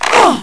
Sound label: fall; quake2; fbetch